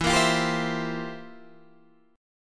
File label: C 11th E